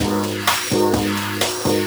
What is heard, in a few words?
VFX2 Loop 128 BPM